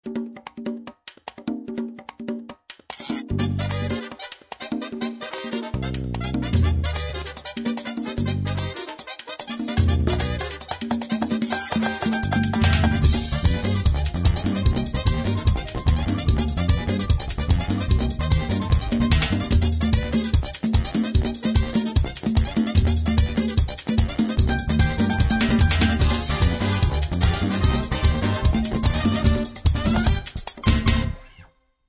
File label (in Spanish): promos meren